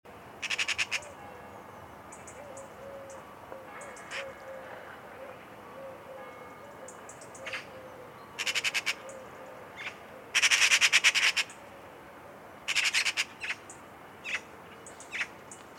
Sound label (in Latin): Pica pica; SETE